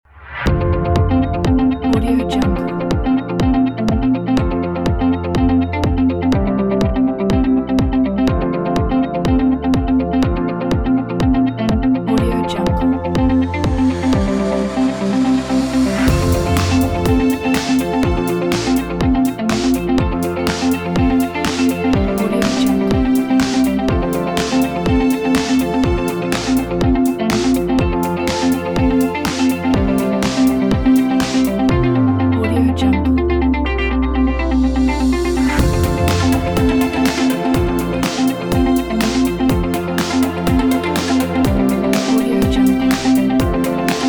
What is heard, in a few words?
corporate inspiring motivational upbeat